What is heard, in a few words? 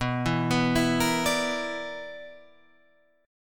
B-Minor Major 7th Double Flat 5th B down